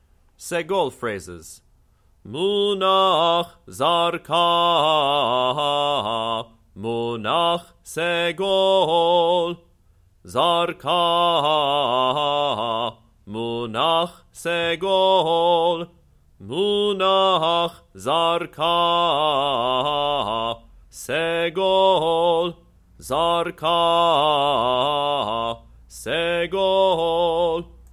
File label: Torah Trope Segol Phrases